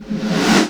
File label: RevAmb, BossDR660 fx; drum machine